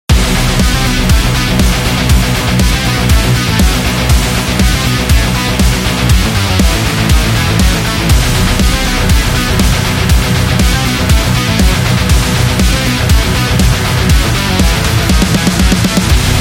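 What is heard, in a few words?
Middle East, epic cinematic trailer music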